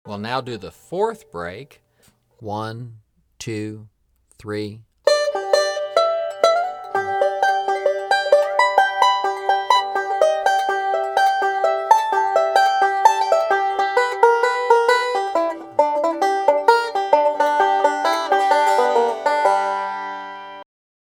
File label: Hammer Break 2 @ 64 Bpm